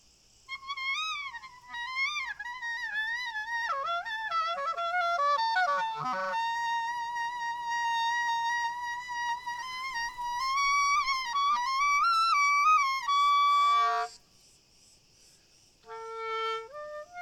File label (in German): Frei O Tn Spiel Stand Mix Grillen Chor Cl Fern